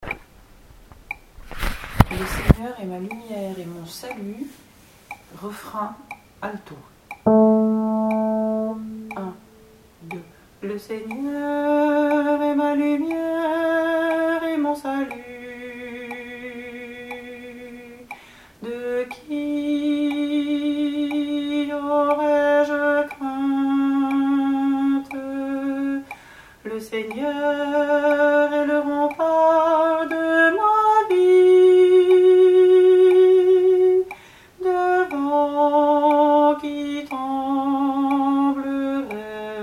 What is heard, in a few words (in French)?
Le Seigneur est ma lumière et mon salut REFRAIN ALTO